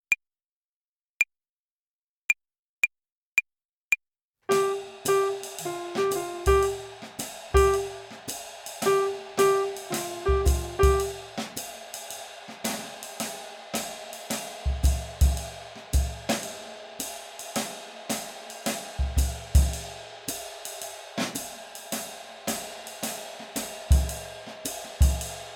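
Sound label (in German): Swing Phrasierung Übung; play along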